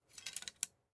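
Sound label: arrow rattle; smite; medusa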